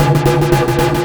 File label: REACTOR, REACTOR CORE; Spectrasonic Distorted Reality 1